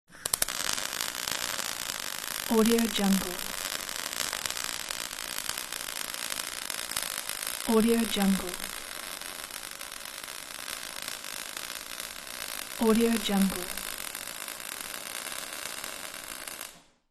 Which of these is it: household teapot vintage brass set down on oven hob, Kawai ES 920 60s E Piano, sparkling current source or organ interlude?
sparkling current source